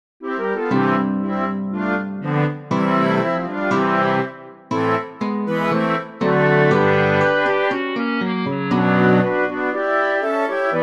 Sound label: trio flutes